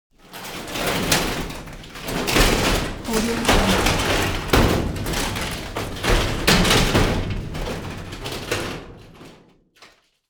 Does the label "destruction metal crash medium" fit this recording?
Yes